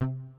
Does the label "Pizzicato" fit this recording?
Yes